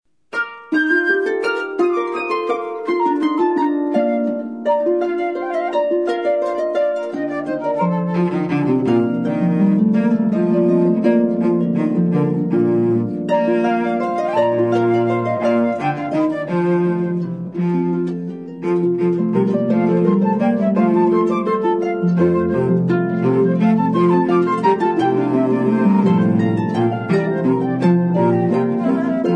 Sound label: Trio, harp; classical; Handel: Concerto, Third Movement